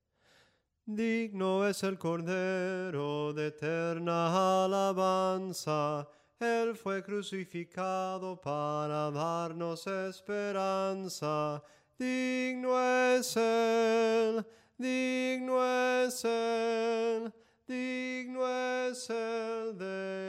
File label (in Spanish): SOPRANO CANTADO, himnos en melodia soprano